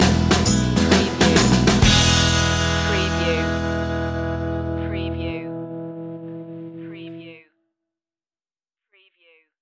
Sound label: sixties flower power stinger